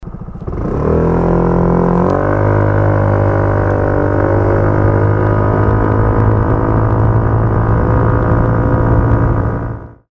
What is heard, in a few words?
cygnus x racing acceleration; 22racing